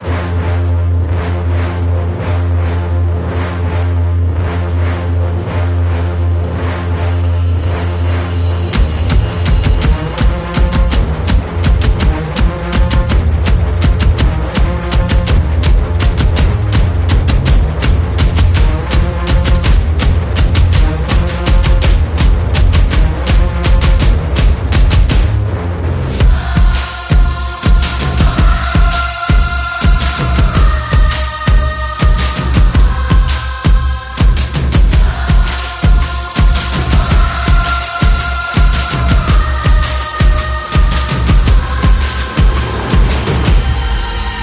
动感时尚

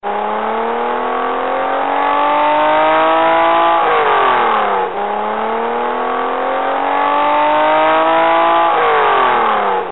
汽车轰鸣; 汽车音效

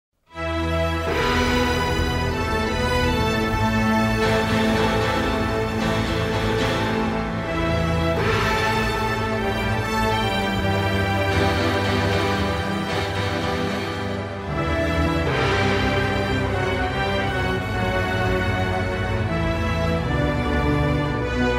悲壮气氛音乐

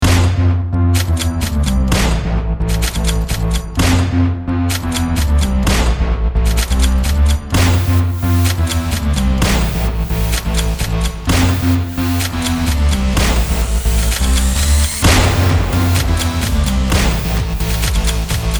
卡点快闪快手抖音浩室音乐枪声节奏短配乐

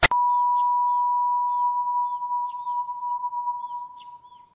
哔消音音效; 综艺类音效

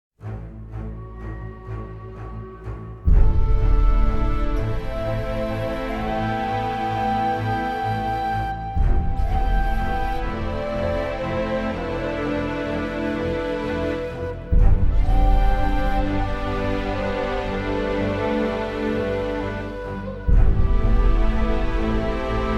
企宣常用, 企业宣传片常用宝藏背景音乐

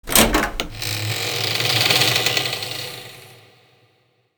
地下室开门; 搞笑音效

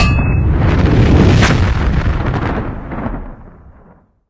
水下爆炸; 汽车音效

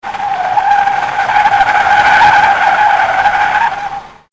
烧胎; 汽车音效

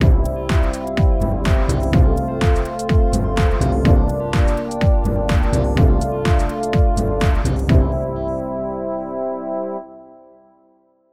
SFX, 片头音乐

